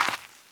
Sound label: Gravel; blindside